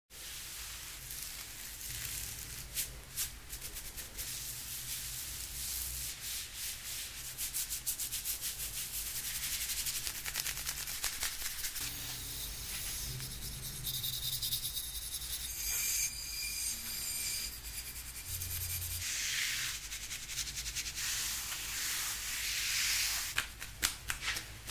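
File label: head massage, asmr; ماساژ سر اسمر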